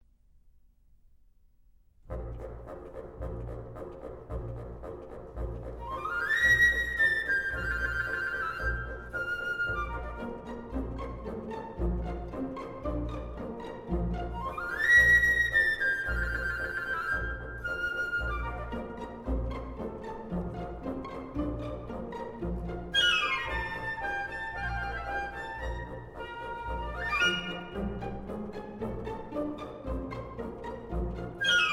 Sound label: Danse chinoise